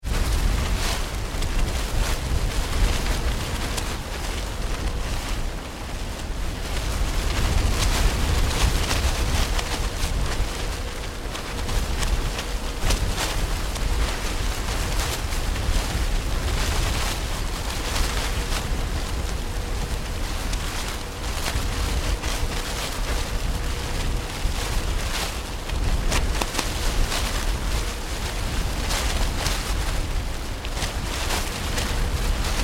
Wind with Flapping Material; wind in small shredded sail; Wind Sound Effects

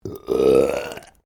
Burp female medium, Human Burping

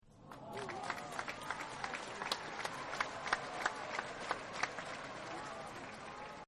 Applause Smattering; Applause Small Crowd-Norm; Human; Partners In Rhyme

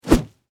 Whip Whoosh Rope, Heavy; Foley; Objects; BlastWave

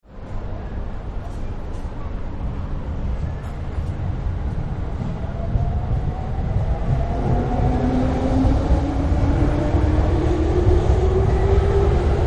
Toky Train Leaves Station; Trains; Vehicles; Partners In Rhyme

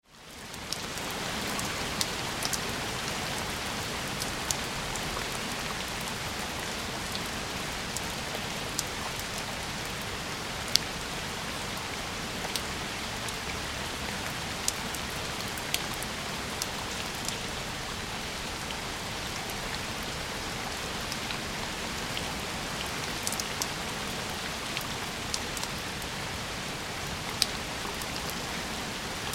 Rain on Pavement; Last 70 secs much heavier; Weather

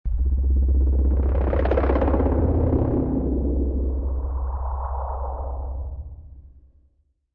Sci Fi, Low science fiction sound; Low Sci-Fi 1; Strange Tones; Sound Design

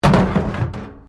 METAL BIN IMPACT PLASTIC CRASH BARRIER

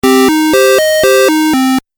Game Music Phrase, Video Game Sound Effects; Twistedtracks

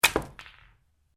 Billiards Cue Cushion Ball, Home billiards table; Pool Sound Effects; Pool (Sound Effects:Sports)

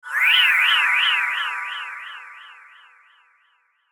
Shimmer Warble Delay Reverb; Blastwave

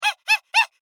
Animal Monkey Chimpanzee; Monkeys; Animals

